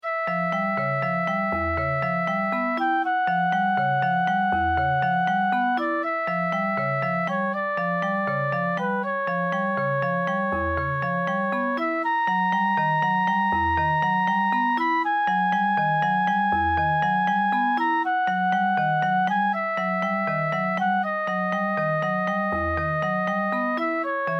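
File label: images flute & guitar; 4 images 1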